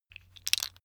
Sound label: tiles wood